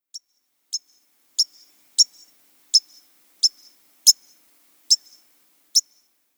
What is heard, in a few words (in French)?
Bruant a couronne blanche cri